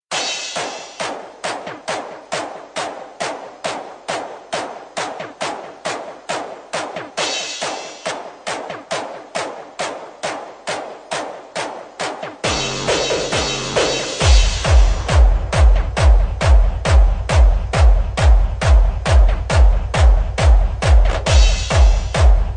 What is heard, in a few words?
slowed reverb remix